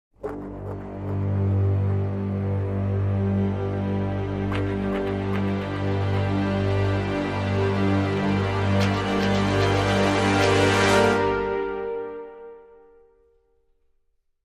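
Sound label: Dramatic Sting, No Percussion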